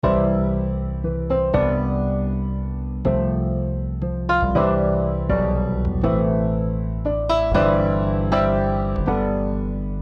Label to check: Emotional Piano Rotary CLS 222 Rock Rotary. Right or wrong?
Right